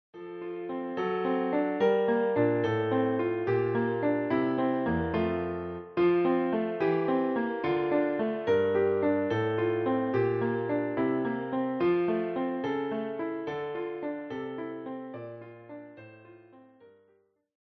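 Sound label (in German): Der Mond ist aufgegangen Klavier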